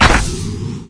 Vehicles Hoverboard

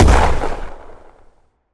Explosion Small

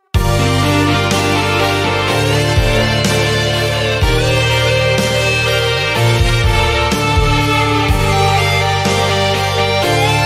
lose theme; Tower Defense Simulator

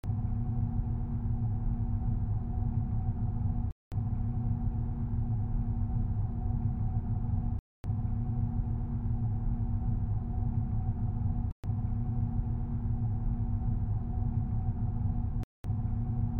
Lockpick

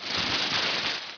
Rustli; Buggy; PS1

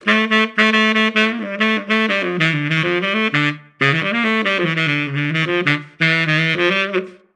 Tuba

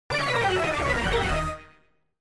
Lose Life!; bomberman world

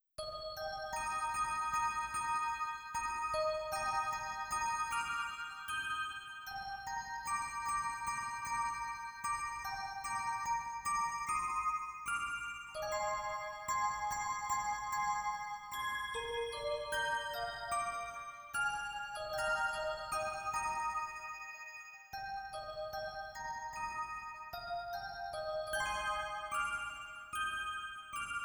Orgel Ver.; Momoiro Closet original soundtrack 2018

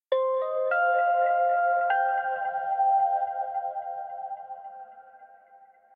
Tension Fill; nova 111; ps vita

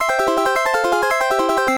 Level Complete; zany golf; genesis

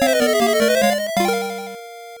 Death; legend of illusion master system

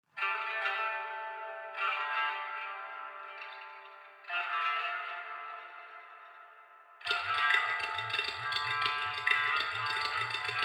Theme outdoor Distant; Battlefield 3

